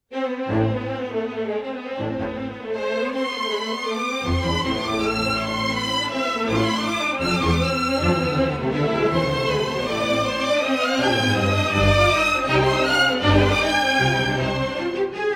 strings wet; Harry Potter

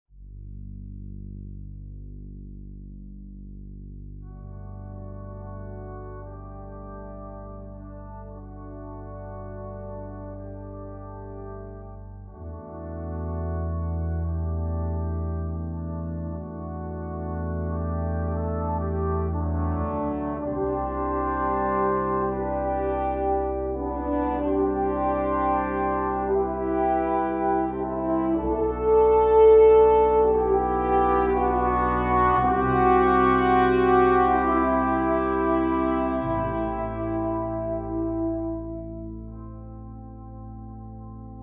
Brass; wivi

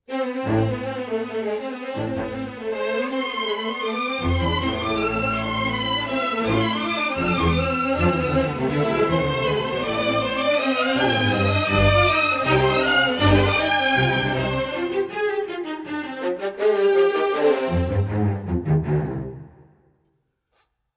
strings dry; Harry Potter

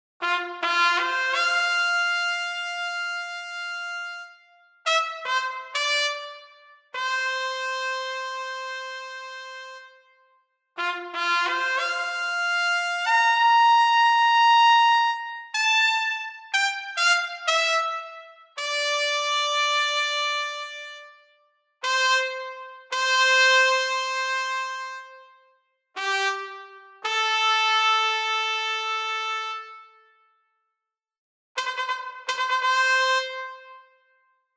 Trumpet; big band

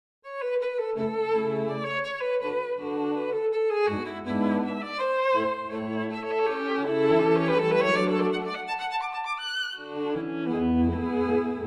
Quartet; SWAM

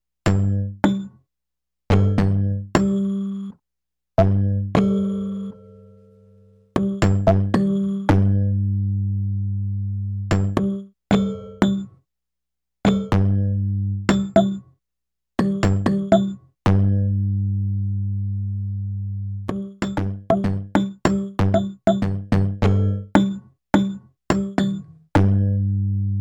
One key stack, prepared rhodes; gigastudio 3

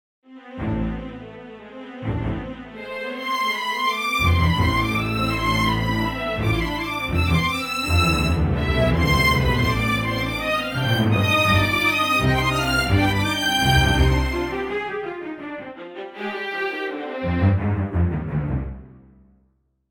strings; Harry Potter strings; KHCSII

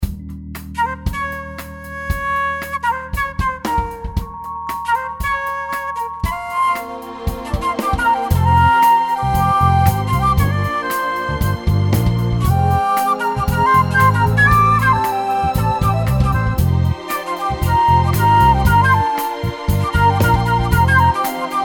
Funk Flute; flutes; Samplemodeling; SWAM

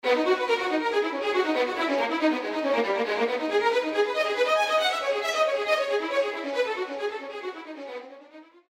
Fast Detache

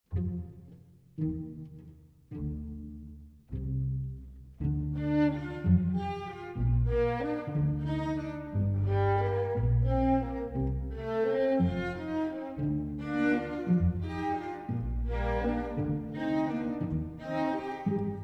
Lovely strings

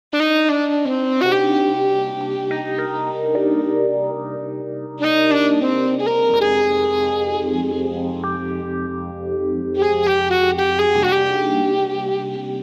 Sax; sax model 20

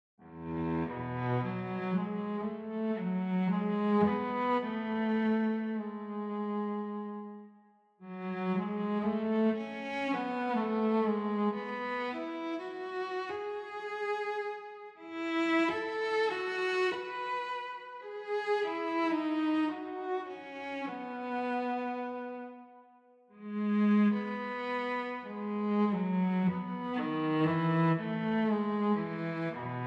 Cello; VSL; soft cello

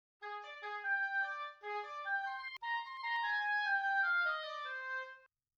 oboe test audio

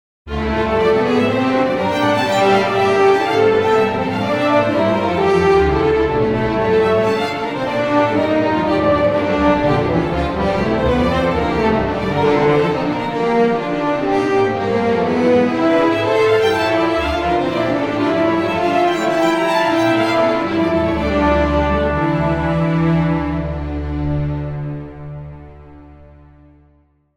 Agitato

